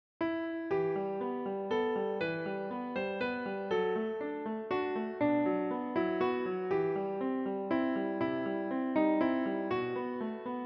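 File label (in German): Greensleeves, Klavier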